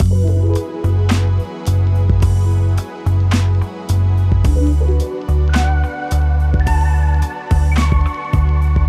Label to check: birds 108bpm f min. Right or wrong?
Right